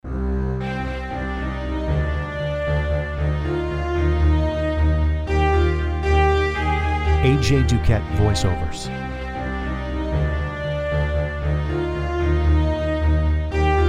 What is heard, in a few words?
Orchestral